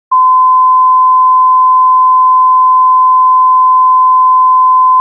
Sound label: sine good; TLV320AIC3100